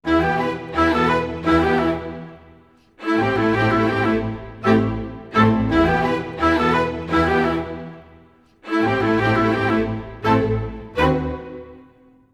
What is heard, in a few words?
90 e min full mix, Orchestral arrangements